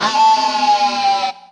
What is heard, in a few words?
Harmonic; Road Rash